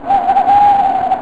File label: OWL; M4 7.5.2; M4™ (PowerMac)